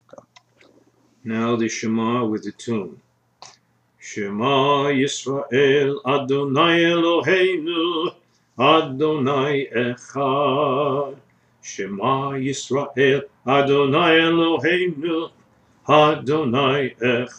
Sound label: Shema Melody